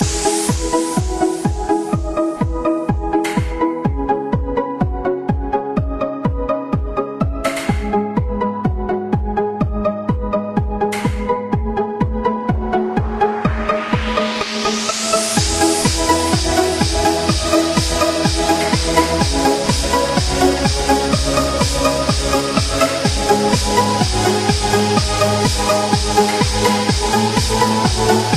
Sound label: Mobile Ringtone